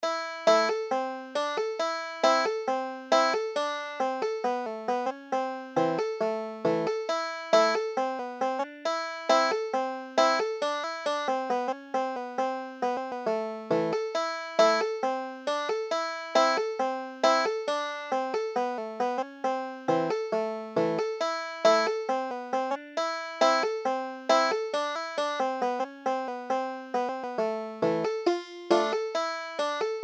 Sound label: russian folk song, banjo